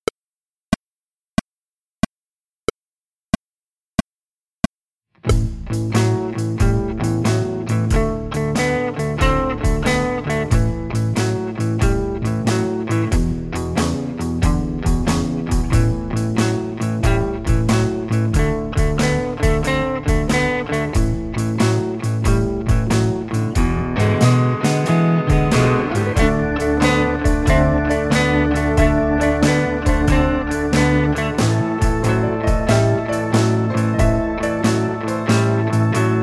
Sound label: With drums